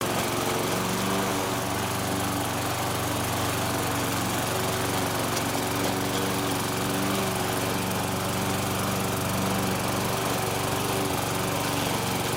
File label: lawn mower